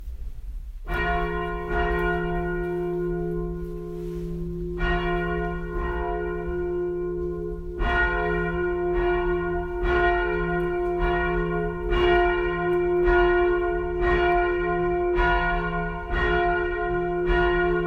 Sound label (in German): glocken; wichmannsburg